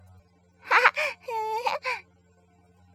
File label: Giggles